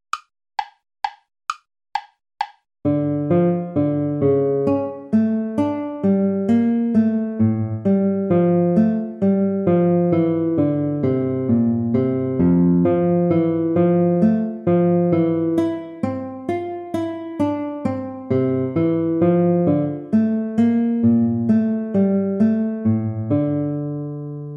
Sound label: minuet